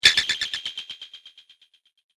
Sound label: Alien Laser Rattle; Laser Sound; science fiction sound effect; star wars / trek style games